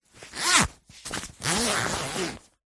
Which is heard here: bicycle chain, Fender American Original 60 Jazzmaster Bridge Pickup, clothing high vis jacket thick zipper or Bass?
clothing high vis jacket thick zipper